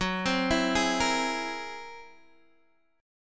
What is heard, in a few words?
Gb 9th Flat 5th Gb down Guitar DADGAD, chord